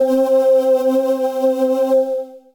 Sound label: Voices, Synth Pad; Creamy Voices; Ableton; Chop and Swing